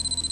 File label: beep